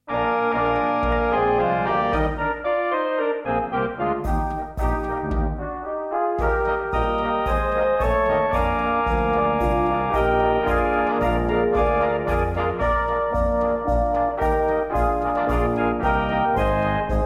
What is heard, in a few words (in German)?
Freizeitpolka